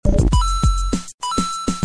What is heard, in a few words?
Coins